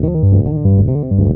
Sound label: hammer loop, bass, FX loops; Spectrasonics; Bass Legends